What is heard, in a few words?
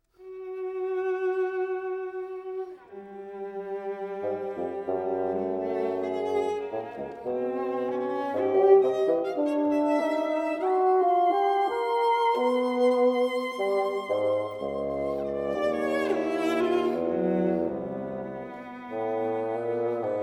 Bassoon and Cello Andante; Diaphonic Suite No. 2 for Bassoon and Cello II. Andante cantando; crawford seeger; chamber works